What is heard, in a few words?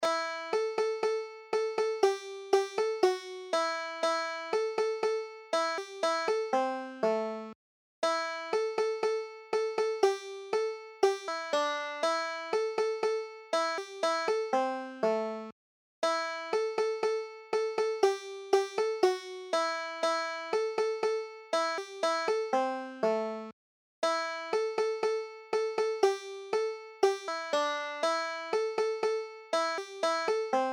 brushy fork of johns creek, banjo